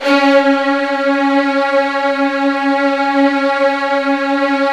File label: C#1, Virtuoso Strings